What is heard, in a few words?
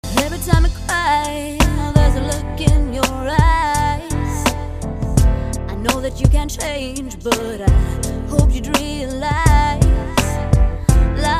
singing